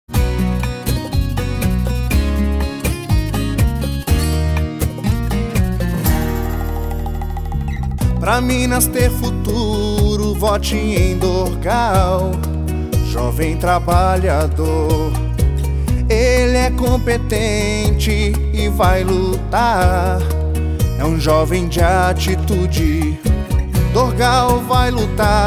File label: Jingle Sertanejo